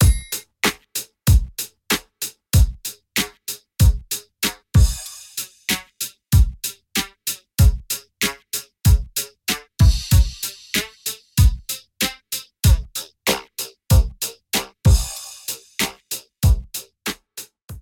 Bass Voco

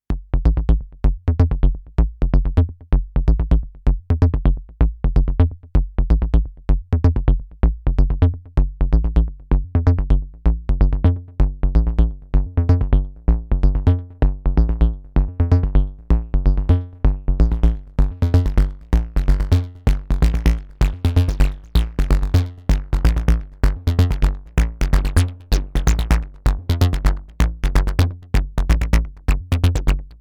Xaoc Devices Sofia Fundamental Bass

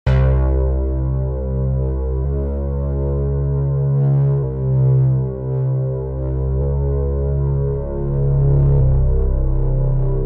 Drive Bass; REV2

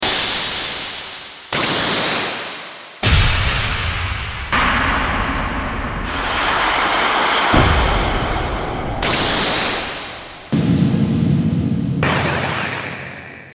Impacts

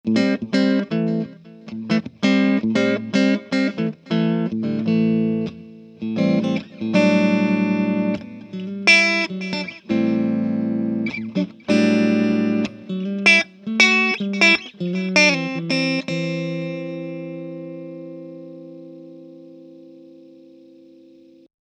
clean

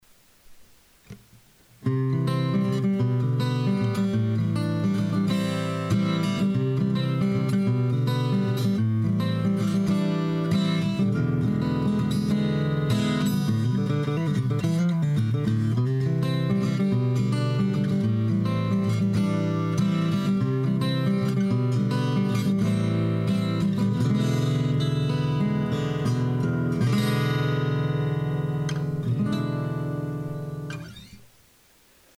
AGit PreAmp EQ

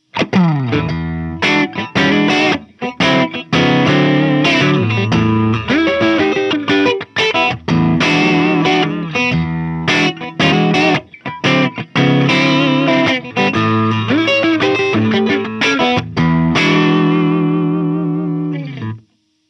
Humbucker Clean; Electro-Harmonix MIG-50

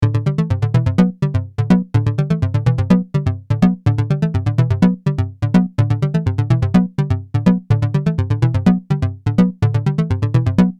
Malekko Manther Accent

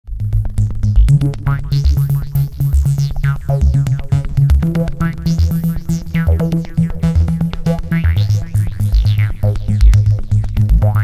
cs30 filter

